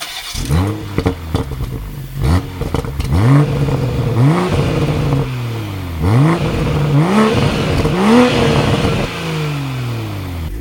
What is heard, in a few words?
BMW M440i Serie; Aerotechnik